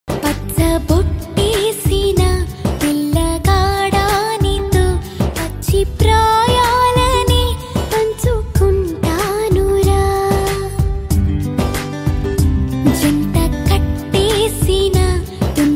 tamil ringtone

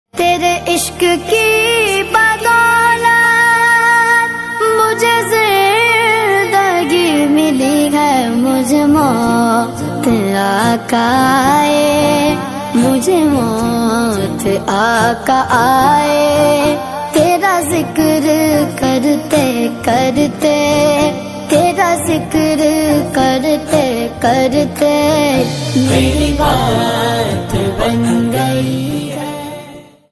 islamic ringtone

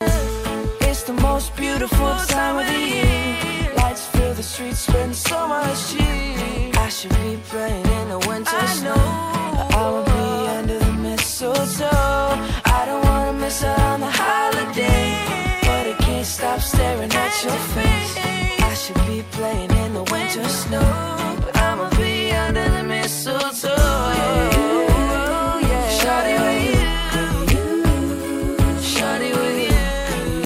English ringtone

